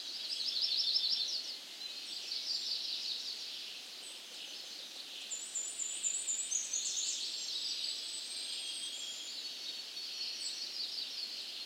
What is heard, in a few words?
ambi forest; Rescue Team3